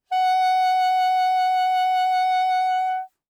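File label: Soprano Saxophone vibrato ff Gb4; Woodwinds; Bb Soprano Saxophone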